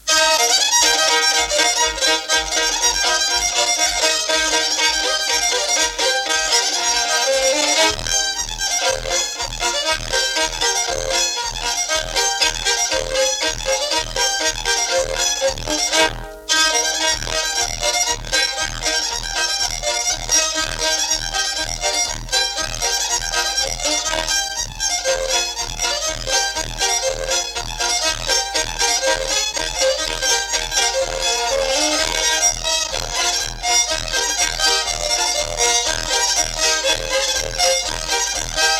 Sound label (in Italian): roncastella con due violini; BALLI SALTATI E NON DALL'APPENNINO BOLOGNESE